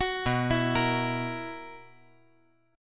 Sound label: B Major 7th Suspended 4th, chord